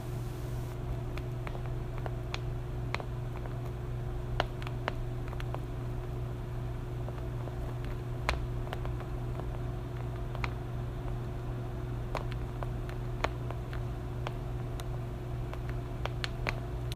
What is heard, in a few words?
Top Layer Crackle